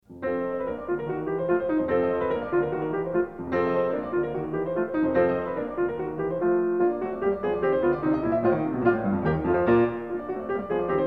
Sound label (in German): Carnaval für Klavier; Wilhelm Kempff; Op 9; Papillons